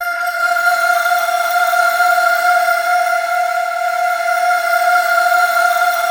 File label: PADS; OMNIVERSUM; Masterbits - Tekkno Trax; SYNTHFX+PADS